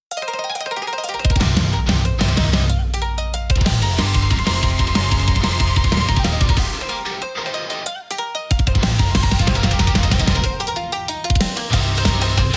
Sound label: intense japanese rock